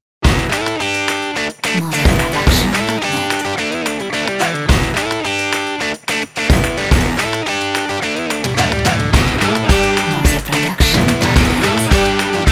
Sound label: Energetic Upbeat Uplifting Indie Rock